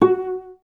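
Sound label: cellos pizzicato F#2; Best Service; Peter Siedlaczek's Advanced Orchestra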